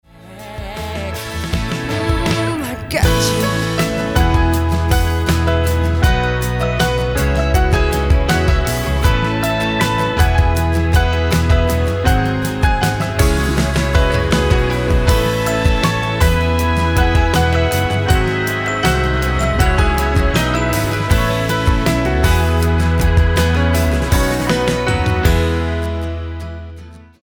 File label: Piano Solo